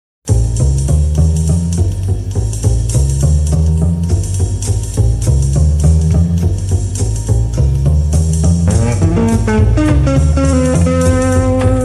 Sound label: beat